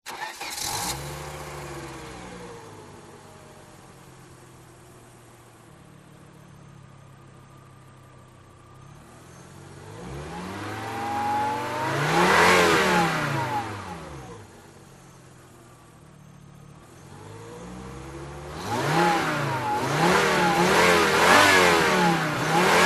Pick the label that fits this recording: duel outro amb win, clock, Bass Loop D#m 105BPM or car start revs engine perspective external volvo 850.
car start revs engine perspective external volvo 850